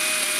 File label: DOTS Turn; Phasmophobia